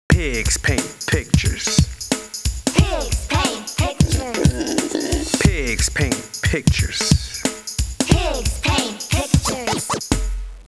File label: Song Letters p P S O N G; School; Fisher Price Ready for School Kindergarten